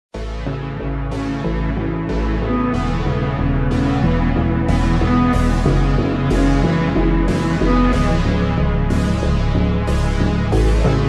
slowed reverb

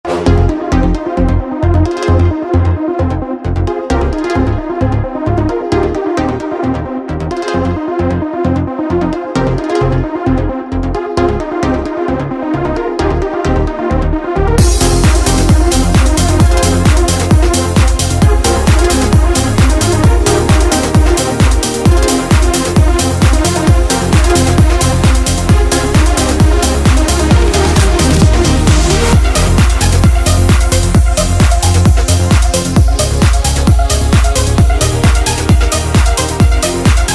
instrumental eurodance

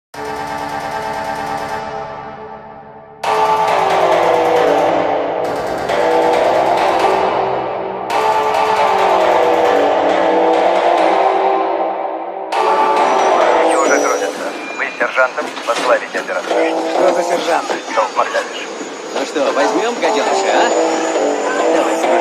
phonk speed up

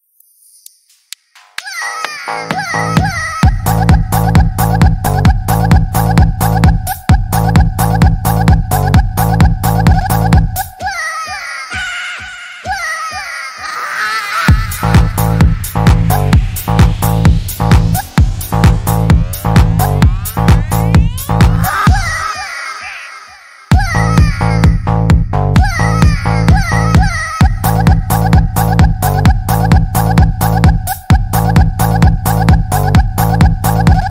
dance music; chicken gun

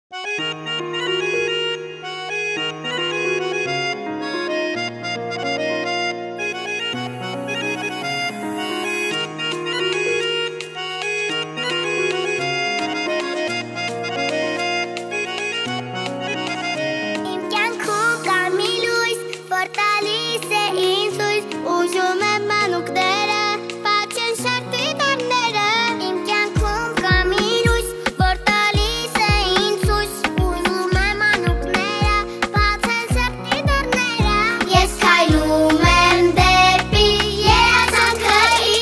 vocal

